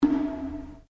Drum Taiko Shime Metal Brace, small Japanese Taiko drum; Miscellaneous Musical